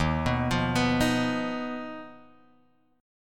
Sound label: Eb Suspended 2nd Sharp 5th, Guitar, Drop D